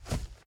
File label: Footsteps Dwarf Dirt; we are the dwarves gamerip 2016